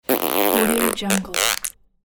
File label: boy mouth fart